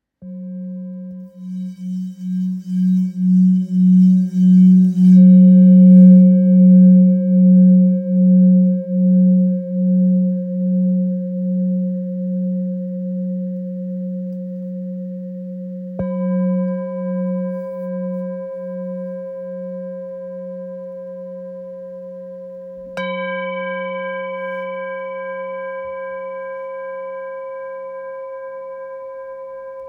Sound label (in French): Bol ancien fa diese